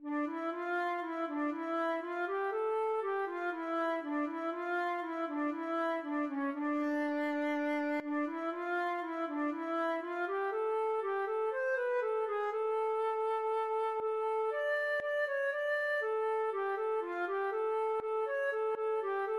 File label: mithrandir, feadóg